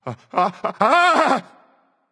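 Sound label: non verbal fear, voice; cernunnos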